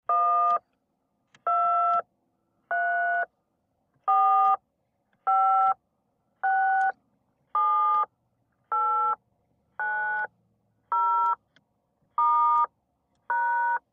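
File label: Cell Phone Dial Individual, touch tone dial; car & cell phones; touch tone dialing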